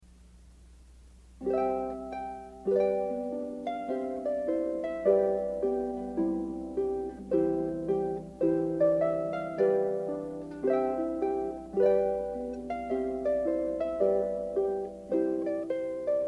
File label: trumpet tune